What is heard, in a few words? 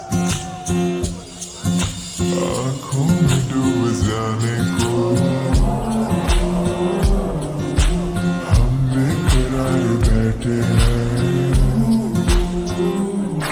Ringtone slowed reverb